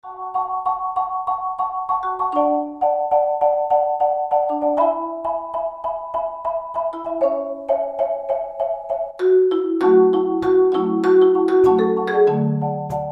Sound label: dance ringtone, Xylophone ring tone